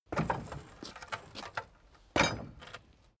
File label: carriage hatch single metal